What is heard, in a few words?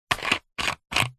food drink bottle 1 litre plastic lid turn open; pepsi